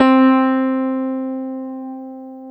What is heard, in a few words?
KEYS, 160 BPM; Ueberschall; Drum & Bass Flash Trackz